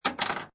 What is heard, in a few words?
Pinball Sound Ball Hits And Vibrates; Video Game Sound Effects